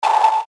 sonar dry element

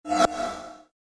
short movement sound

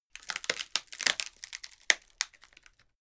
wood break slow

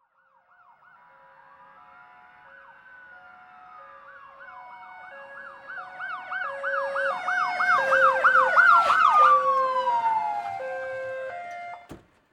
police car arriving

